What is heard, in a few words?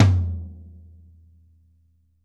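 floor tom; drum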